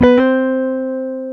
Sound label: Jazz Guitar